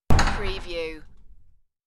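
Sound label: Door Bust Open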